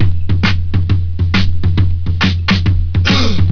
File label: rock and roll, Funky Drum loops